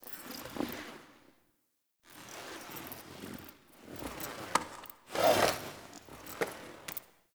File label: Data Pad Foley; Cinematics; Warframe; TheNewWar; Zariman